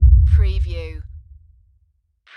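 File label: Production Element LFE Impact; Sound Effects Bible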